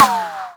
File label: ricochet, bullet impacts; Half Life Model Pack